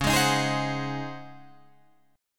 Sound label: C sharp Major 7th Suspended 2nd Suspended 4th C sharp, Guitar Drop D